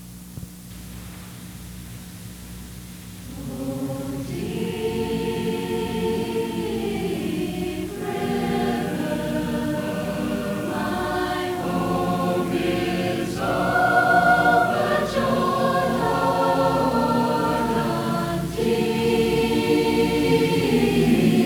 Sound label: Choir - High School, Deep River; Group